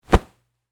Pillow Fluff Hit, Foley, Pillow, Fluff, Hit, Impact; Bathroom; Household; BlastWave